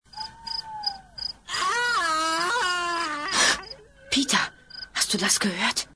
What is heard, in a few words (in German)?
Schrei